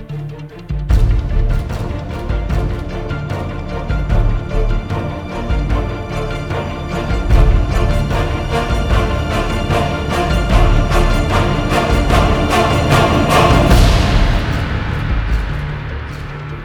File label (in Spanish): tono movil